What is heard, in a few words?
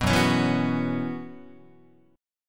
Gb 7th Suspended 4th Gb down Guitar, chord